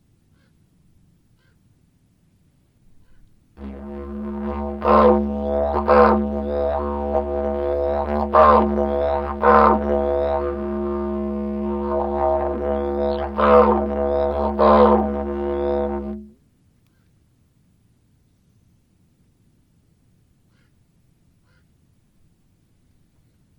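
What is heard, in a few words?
didgeridoo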